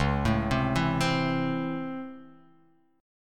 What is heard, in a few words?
C sharp Minor Major 7th Sharp 5th C sharp, chord